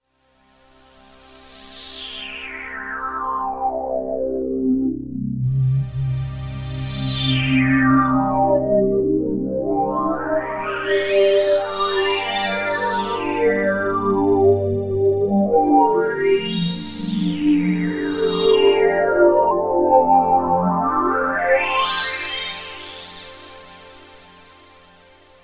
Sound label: Roland Alpha Juno-2, Cosmo Sweep; Original Sound Chart patches